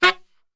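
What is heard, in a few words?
F# saxophone shot, Brass Super Section; Best Service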